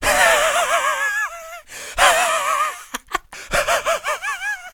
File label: Old Breathy Laughter